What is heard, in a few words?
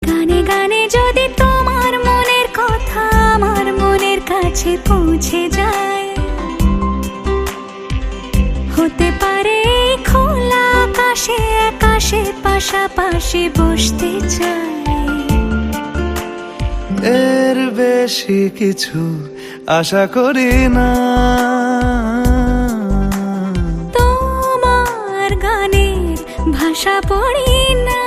female Ringtone; Dhumketu Movie